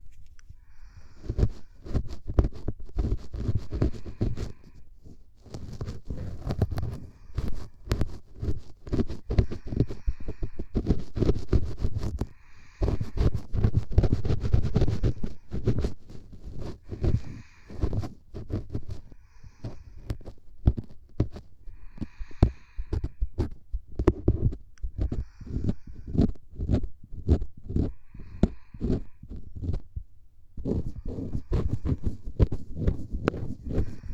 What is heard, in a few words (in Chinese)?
右耳 · 掏耳 · 梵天, ASMR; 右耳・掏耳・梵天长篇【额外音轨】